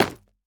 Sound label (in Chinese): 下界砖破坏声